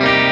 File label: clean Arpeggio down Bm7